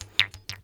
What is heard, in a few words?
KHOMUS